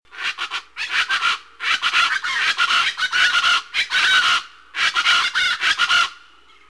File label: Plain Chachalaca